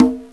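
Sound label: conga, Linn Drum LM1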